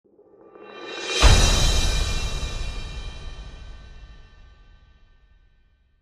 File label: Tribal Cymbal Swell To Deep Hit; Musical; Blastwave